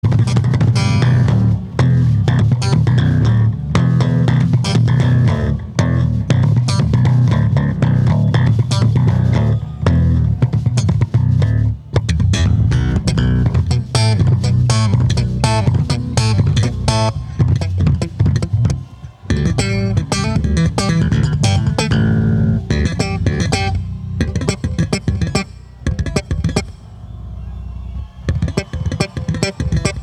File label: Bass Solo